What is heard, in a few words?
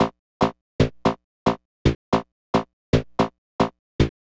bass line